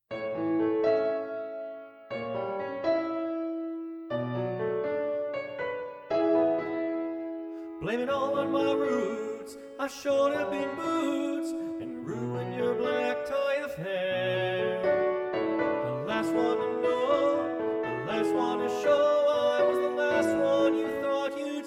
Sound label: Soprano2